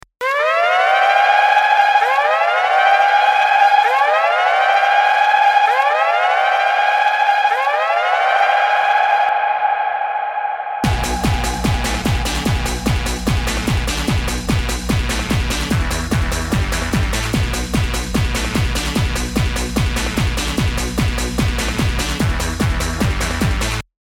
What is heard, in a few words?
techno; 10 seconds of techno